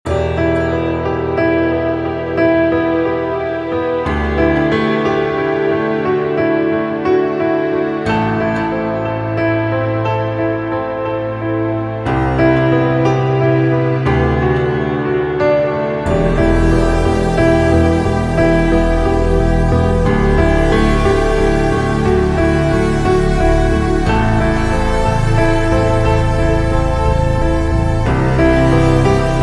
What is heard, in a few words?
Instrumental